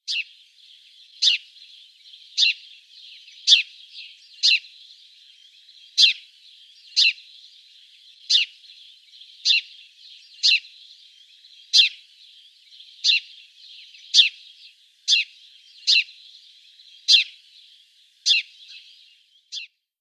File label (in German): haussperling; Voegel; Artenschutz am Gebaueden